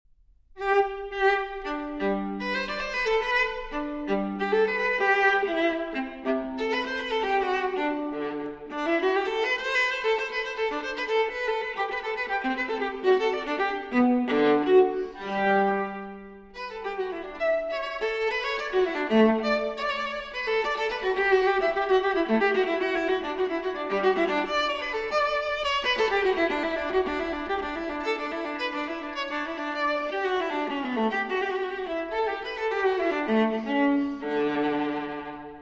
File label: Cello Suite No. 1 in G Major Transcr. For Viola Courante; J.S. Bach; BWV 1007